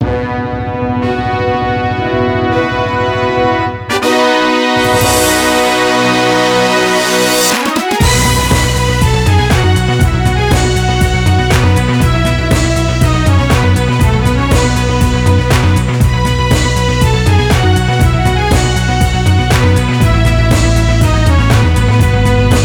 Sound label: Jingle; Gran Turismo 4